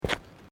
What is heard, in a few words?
Footsteps Boots Ceramic Paving Tiles Step; Footstep Foley; Human